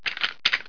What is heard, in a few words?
mp7 foley, weapons